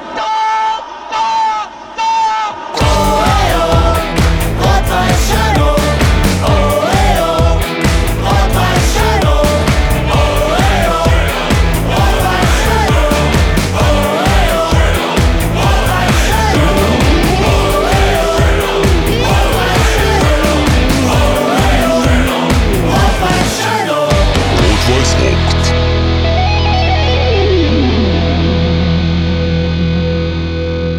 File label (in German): Torhymne; Rot-Weiss Schoenow